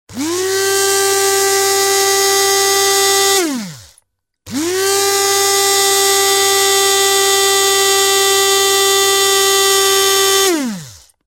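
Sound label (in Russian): звук блендера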